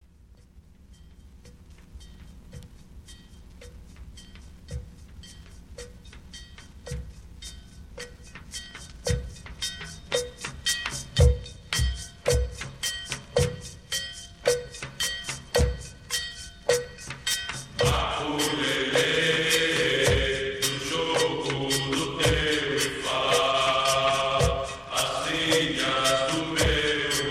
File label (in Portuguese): Coro maculele